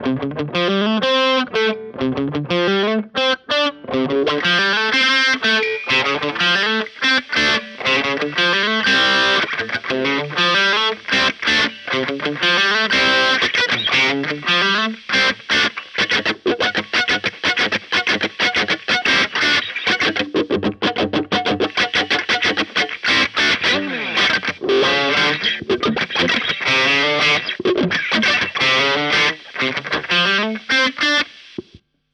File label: Morley Lead Wah